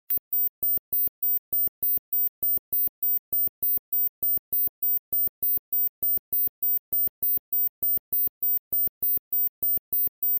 ultrasound; cstrike

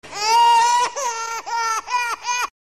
cry; cstrike

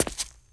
player jump; cstrike

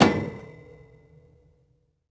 ladder; cstrike

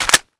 Mag In; new weapons; sg552; cstrike

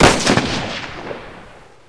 scout fire; new weapons; cstrike

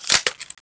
p90 clipout, weapons; cstrike

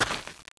player dirt; cstrike

